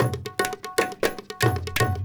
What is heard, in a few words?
INDIA L; Spectrasonics - Heart of Asia CD2